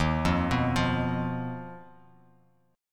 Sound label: Eb Suspended 2nd Sharp 5th, Bass Standard; Ebsus2#5 arpeggiated; JGuitar